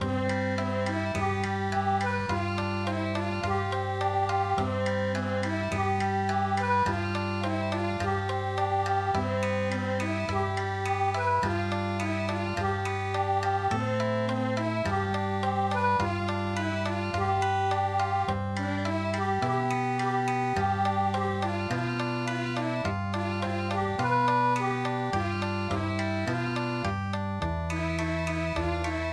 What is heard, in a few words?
Okinawa; Akatasundounchi